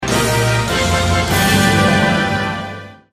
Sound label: Victory Fanfare